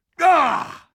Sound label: pain, combine soldier; hl2